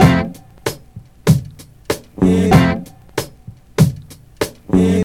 96 bpm drum groove f key, Free breakbeat sample - kick tuned to the F note. Loudest frequency: 823Hz